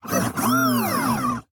激动的哔哔声; 堡垒（守望先锋）

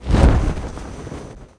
跳伞; 红色警戒2; 超级武器音效; 伞兵

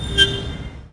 交通喇叭; 红色警戒2/环境音效

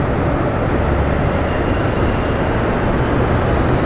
城市喧闹; 红色警戒2; 环境音效

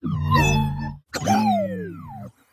欢欣鼓舞的嗡嗡声; 守望先锋; 堡垒

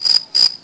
蟋蟀; 红色警戒2; 环境音效

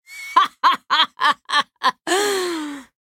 laugh; 辛德拉（英雄联盟）; 暗黑元首

